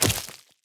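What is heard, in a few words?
footstep spider giant; animal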